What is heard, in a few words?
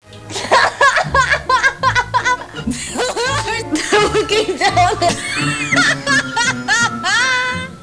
laugh; GA laughing, from Inside the X-Files